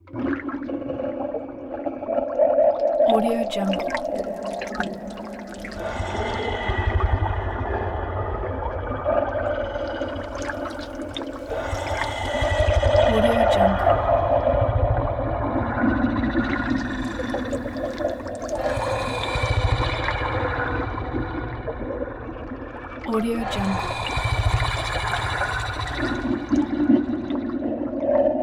water weird creature drone; futuristic; موجودات عجیب و غریب

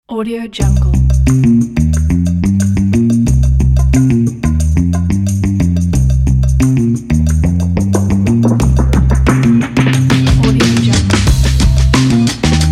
groove upbeat funk; موسیقی بی کلام

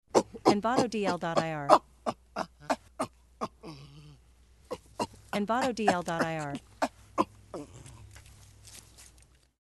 Ape Grunts Breaths; نفس کشیدن بوزینه; nature sounds

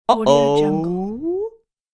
male suspicious surprised; human sounds; غافلگیر شدن مرد مشکوک

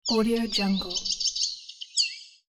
icterine warbler phrase; nature sounds; پرنده در یک روز بهاری

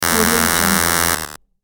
wrong answer buzzer notification

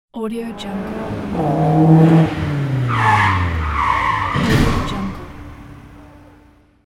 car crash; ترمز شدید و تصادف; urban sounds

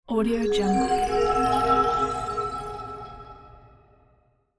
hypnotize spill spell; game sounds; هیپنوتیزم

